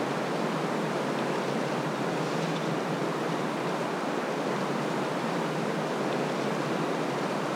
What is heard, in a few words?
lightwind; ambient